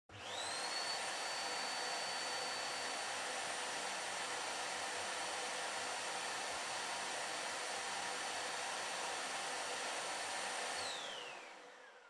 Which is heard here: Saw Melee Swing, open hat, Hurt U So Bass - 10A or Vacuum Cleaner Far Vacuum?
Vacuum Cleaner Far Vacuum